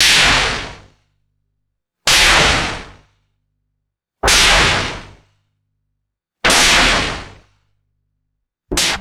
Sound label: retro synthesized static impact sounds; cartoon